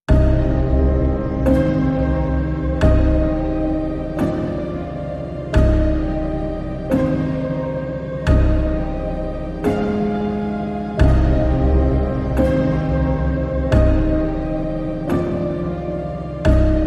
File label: Pendulum Of Fear, No Guitars